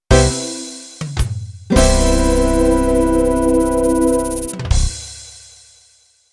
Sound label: Beguine End